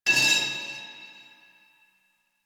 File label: Pipe Cement Scrape; Warframe; Ambience; Nineteen Ninety Nine